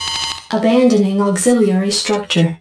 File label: Exit Windows; BroodWar